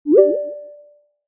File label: rise